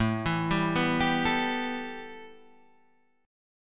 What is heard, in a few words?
A 7th Suspended 2nd, A, down